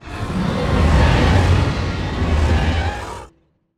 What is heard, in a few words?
dinosaur roars long; SoundsCrate